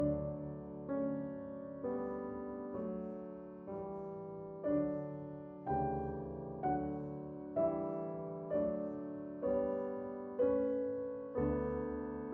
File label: Piano Concerto, Adagio; Brahms